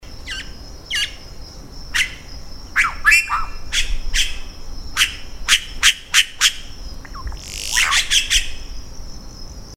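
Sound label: Cacicus haemorrhous; EE Juréia; SP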